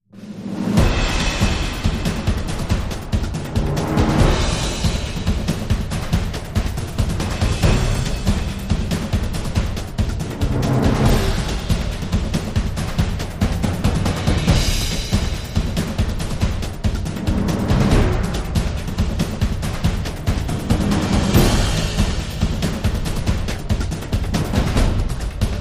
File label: Epic Film Storm Drums